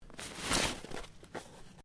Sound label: Body Movement Snow Lift; Body movement foley to simulate falls and deaths; Bodies (Sound Effects:Human)